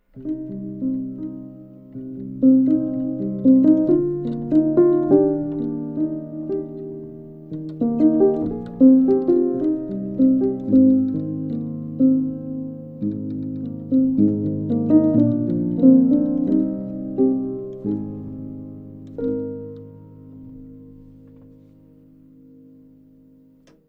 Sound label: upright, Royer r121